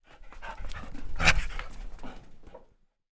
animals dog med playful running around growl inside